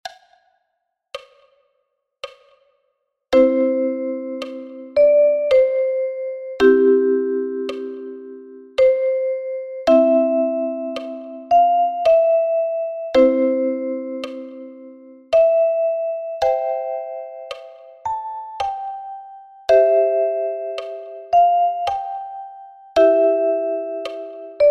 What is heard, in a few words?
Trumpet Tune